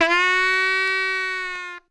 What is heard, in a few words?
Sax; Sonic Foundry (Sony Creative Software); Stylus Pressure Urban Grooves on Digital Wax; Wickey Wah